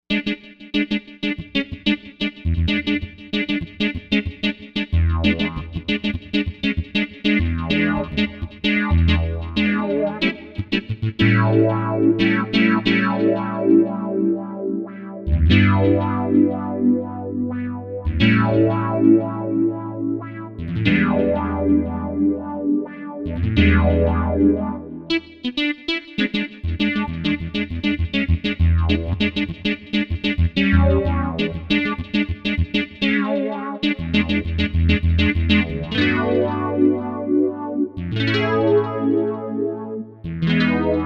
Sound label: WahGuitah, Novation UltraNova; Factory Patches